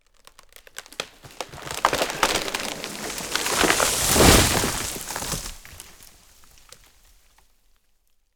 Tree Cut Crack And Fall In Forest Mono

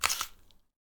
Apple Crunch

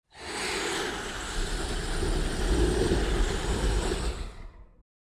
Cinematic Dragon Sound Dragon Long Huff

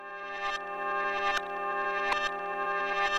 Loop harp

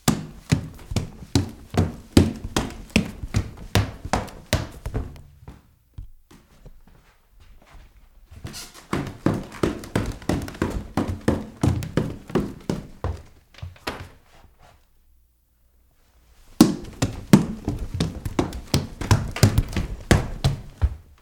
Running Up Down Stairs

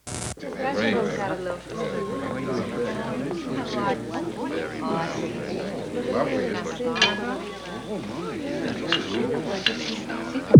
Small Dinner Party

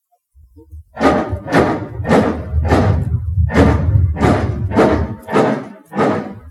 Metal Gears Bridge

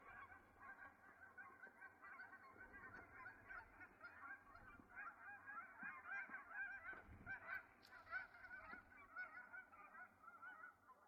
Wild Geese Fly Overhead

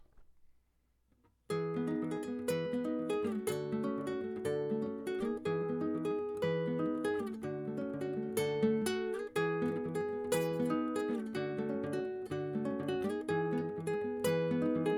Quick Bouncy Happy Bright Melody

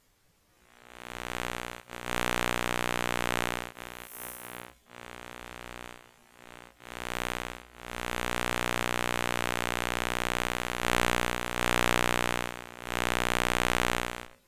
Pickup monitor

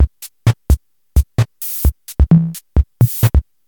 Space Invaders Drum

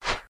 Weapon swipe

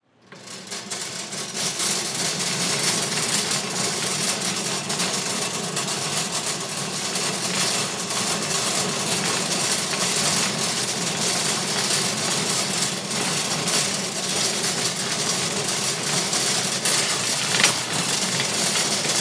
Supermarket Cart Rolls

